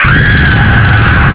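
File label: flame death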